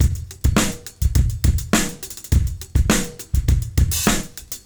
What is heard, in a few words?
Beat Loops, 103; Zero-G; Monster Beats